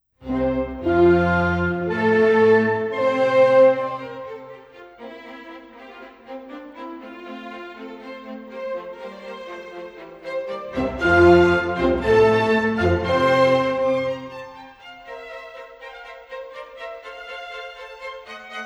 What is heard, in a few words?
Concerto for Two Horns in F Major, Allegro con brio